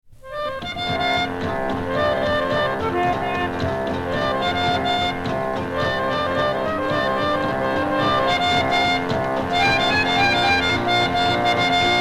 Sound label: Wake Up Organ